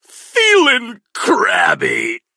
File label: die